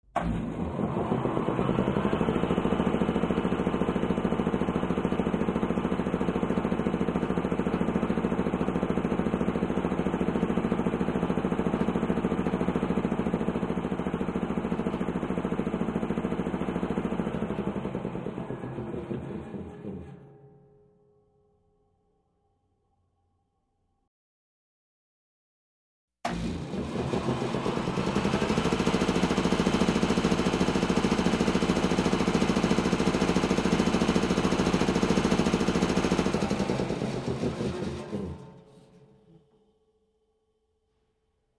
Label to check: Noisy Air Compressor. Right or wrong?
Right